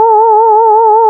LEAD, Nord; Wizoo - Powered Nord; LEAD PAD HRN

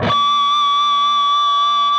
PRS PINCH, Guitars

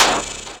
SD HOU, Dance Mega Drums; Best Service

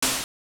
Open Hat, Genesis

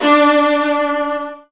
Virtuoso Strings

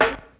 FUNK SNR; DANCE KIT; Crypton - DrumBase5000; DANCE KIT #3

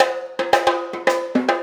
Trap Kit, Drums; Sonic Foundry (Sony Creative Software) - Essential Percussion

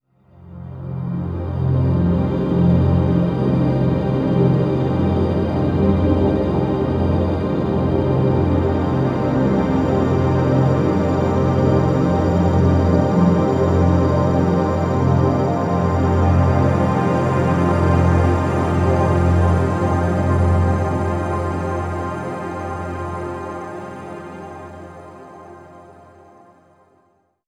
Low Long Complex, Complex Wave; Sonic Foundry (Sony Creative Software) - James Johnson Spektral Minimalism

